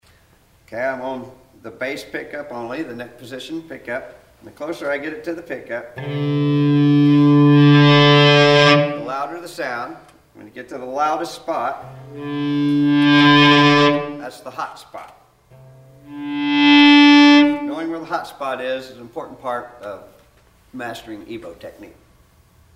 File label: Hot Spot